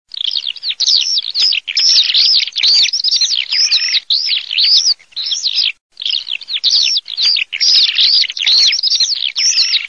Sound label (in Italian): allodole, birdsound